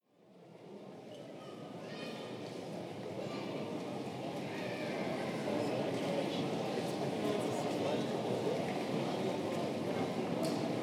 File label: train station braunschweig